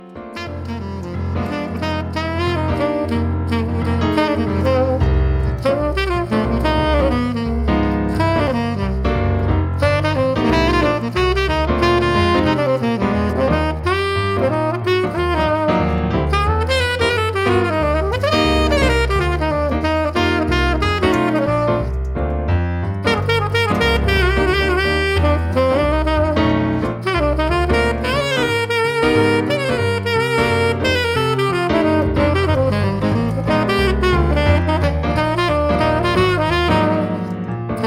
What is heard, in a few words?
jazz band